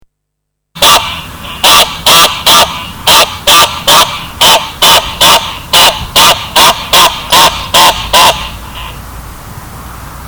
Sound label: goose emergency